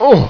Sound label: pain; wanted